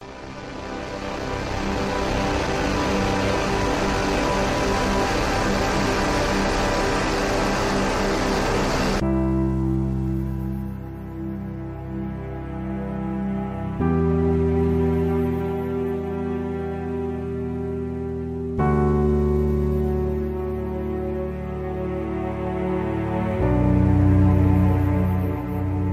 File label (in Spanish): musica cinematografica sin copyright